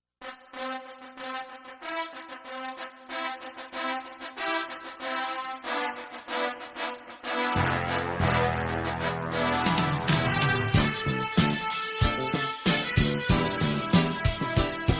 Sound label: drama victory ending; rocky